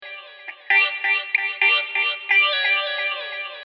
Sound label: Guitar 133 G, dub